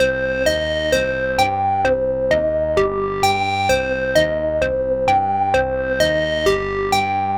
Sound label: 130 Cm synth, Synth Loops, Trance; Trance Explosion Vol1